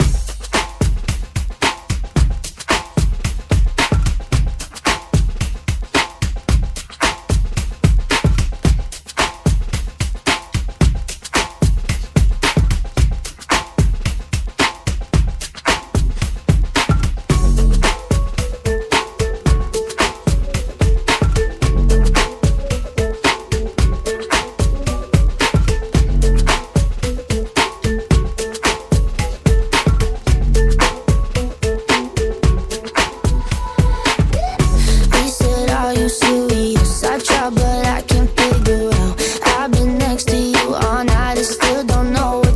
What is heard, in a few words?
Redrum 111